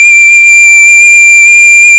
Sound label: whistle D